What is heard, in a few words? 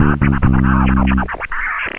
Mission Failed; Pizza Hot